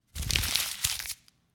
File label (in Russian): bumaga mnut komkayut